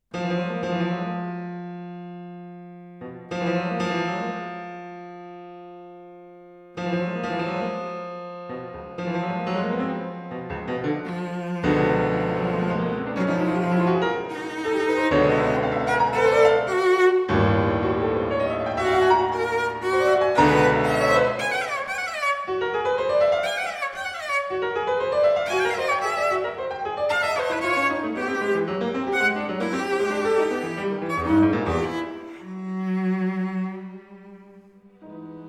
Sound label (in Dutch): Viool, cello en piano